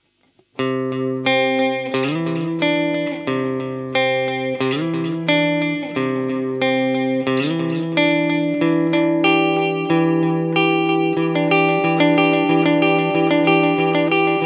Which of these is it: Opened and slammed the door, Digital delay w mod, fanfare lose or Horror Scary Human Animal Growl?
Digital delay w mod